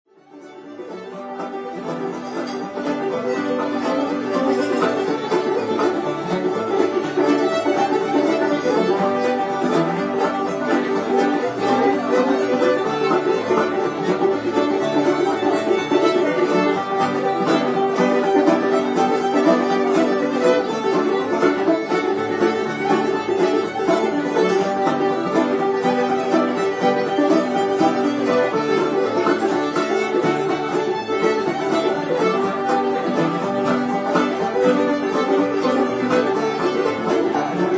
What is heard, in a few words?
Fiddle, Banjo, Uke, Guitar; Walking in my sleep; Old-Time JAM